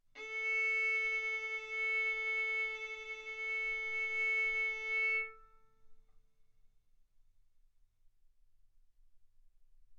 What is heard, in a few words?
Violin arco A4 B4; mf; sul A; stereo